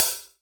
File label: HiHat, acoustic kit